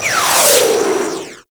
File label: Inferno Comet Proj Whiz; Warframe; Ember